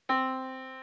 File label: Yamaha Disk, piano sound